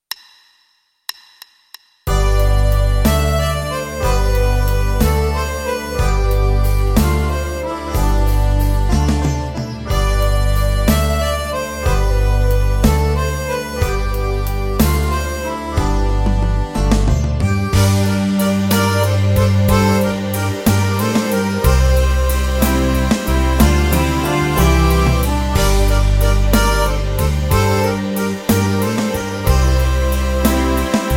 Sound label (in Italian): Tastiere Tutti